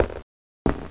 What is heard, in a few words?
walk f